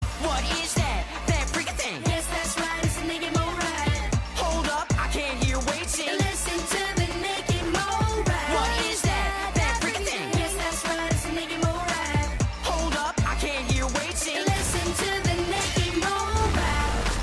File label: rap remix